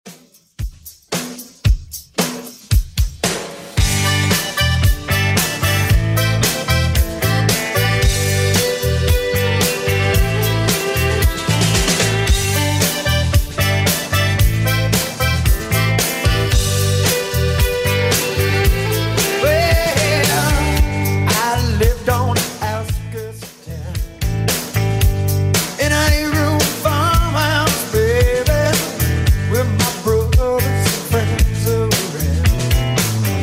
80s Redrum 113bpm

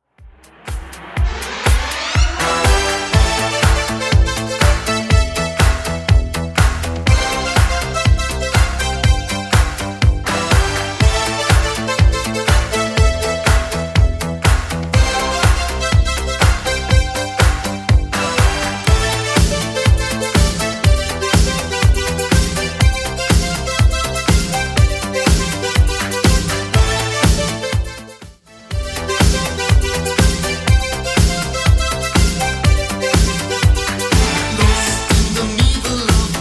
80s Redrum 122bpm

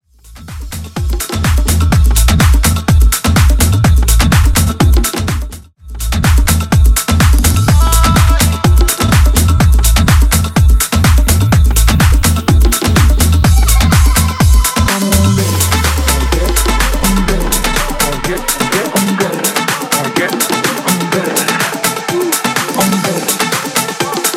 House 125 BPM; House Remix

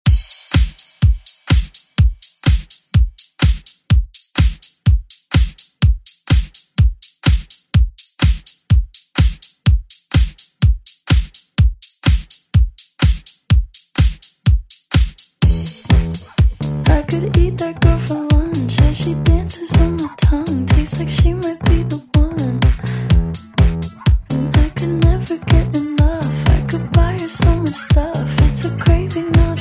ReDrum; DJ Mhark

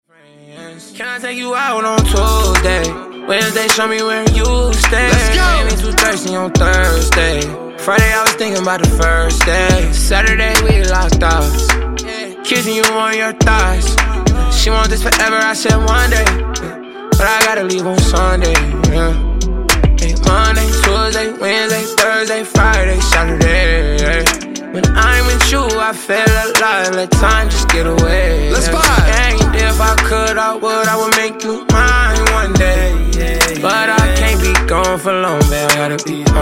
Dj Intro Outro 105Bpm

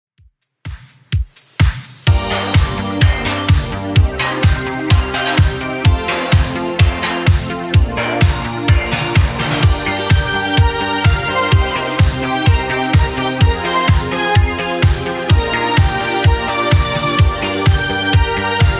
80s Redrum 127bpm

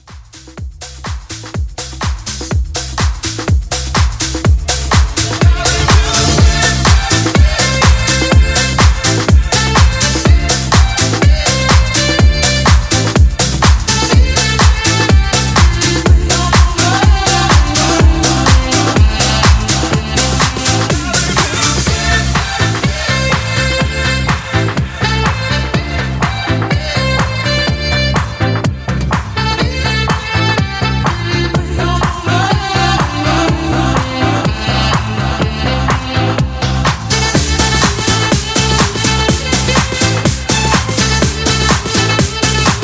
Classic House Rework, 124

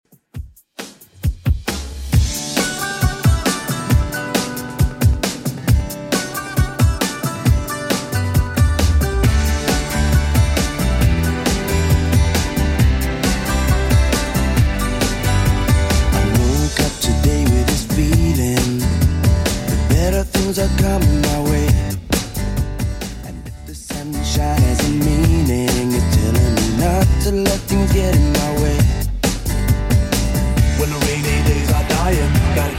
90s Redrum 135bpm

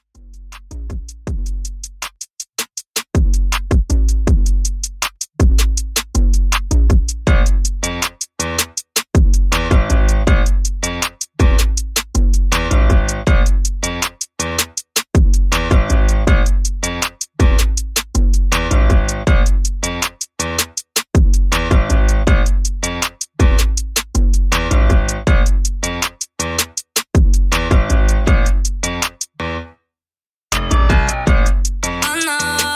Bass Twerk 80Bpm

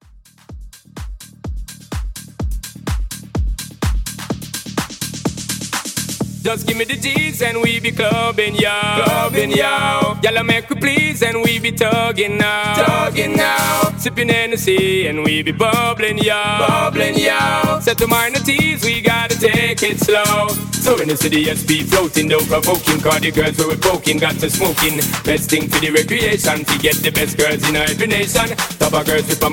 House Mashup 126

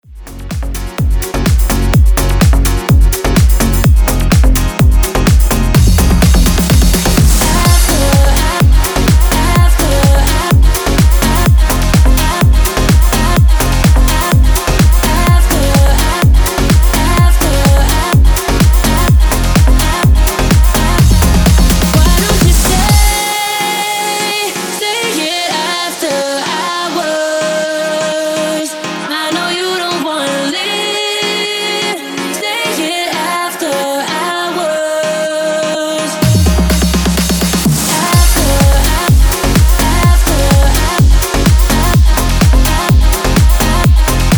remix 126 bpm

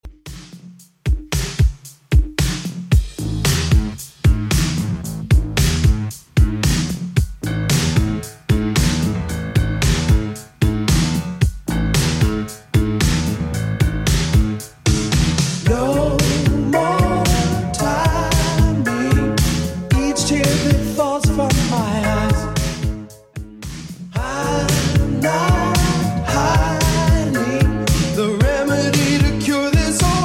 80s Redrum 113 bpm

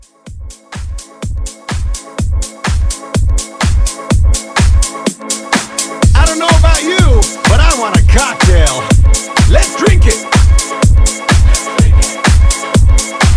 House 125 CLEAN; 2024 House Mixshow Blend Edit

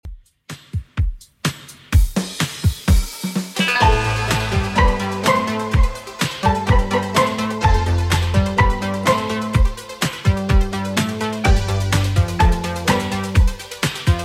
80s 126bpm; Redrum

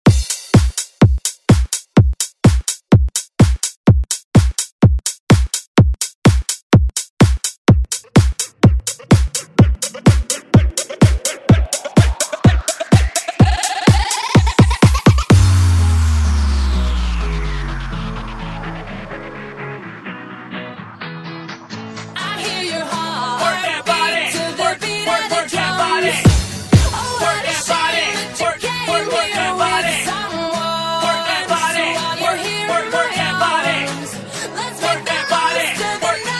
Party Hype Redrum

